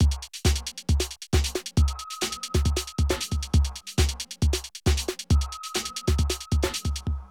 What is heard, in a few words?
Drum loops, Rave & Hardcore